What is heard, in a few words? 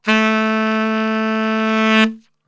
A 2 SAX; Best Service; Brass Super Section